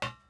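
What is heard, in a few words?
metal run, footsteps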